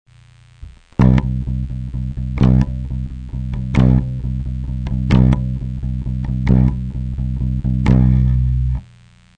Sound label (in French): accent, bass